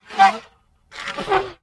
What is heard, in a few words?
Elephant Breathy, elephants